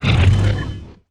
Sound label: Robo Death